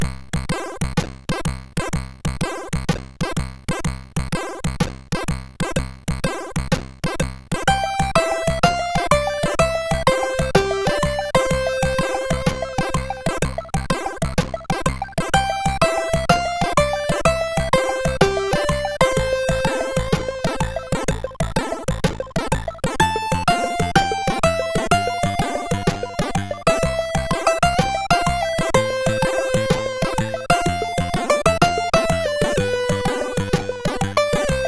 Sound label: sid, CSG8580R5; Total Refill